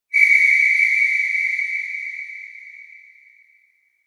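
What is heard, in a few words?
electronic grasswhistle play; oomori; anemoi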